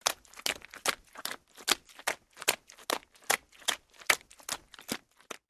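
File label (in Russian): шлепки по мокрой грязи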